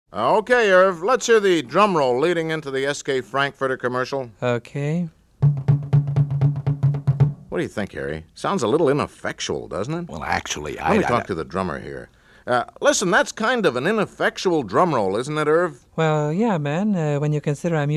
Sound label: Ineffectual Drumroll